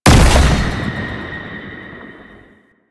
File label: Unyielding Gallop Hit; Warframe; Warframes; Dagath